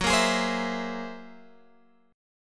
F sharp 9th Flat 5th F sharp Guitar Open G

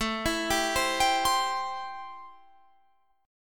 A-Minor 7th A Guitar Drop D

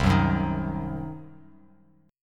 C Diminished C, Bass Standard; strummed; JGuitar

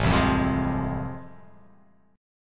A Minor Major 7th Sharp 5th

